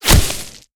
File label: ground hit, damage; DCS